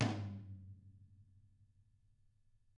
TAMA TOM; ILIO; Double Platinum Drums 1

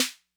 Snare Accent Mid Tone Max Snap, 808; classic machines

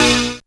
syn c#, Dance Synths Stabs & Hooks; vol.37 - Dance Synths

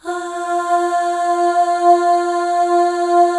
AH FLAIR, FEM; Spectrasonics; FEM.2 AHS

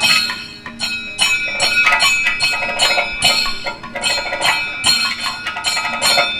KATAK, Indian Traditions

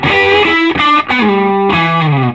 SOLO E, Vintage Blues Guitar

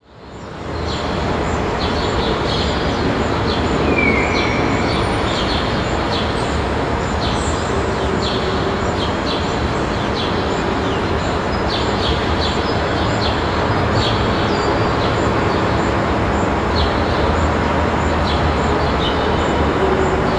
NYC BIG SQ, TOWN FX; USB Soundscan vol.02; Underground Hip Hop; AKAI

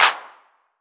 Old Skool House Clap

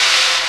BRUSH, Kawai R-100 Drums

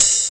HHO PSS50; 90 s Sample CDs; Sound & Vision - Gigapack I CD 1 (Roland)

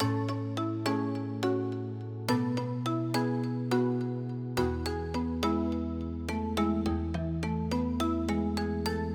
Emaj 105BPM Pluck; 99Sounds; Monosounds; Music Loops; PANDORA BOX!; Radio Station

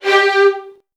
G2, VN 16TH, Virtuoso Strings; ILIO; Kirk Hunter

